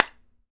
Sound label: Break Egg Hit Concrete; SFX Kit; Foley - Misc